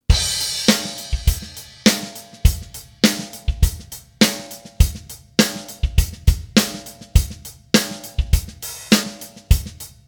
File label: funk